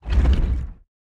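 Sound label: movement heavy push; smite; god atlas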